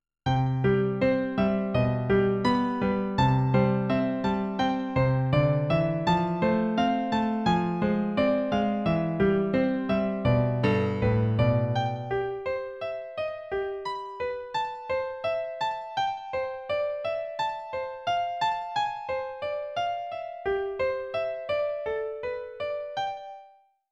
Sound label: Piano Practice; The Legend of Zelda Majora's Mask